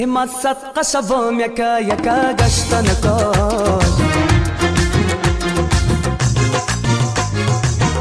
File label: Afghan Musik